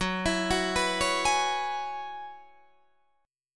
F sharp 7th Suspended 2nd Suspended 4th F sharp down Guitar DADGAD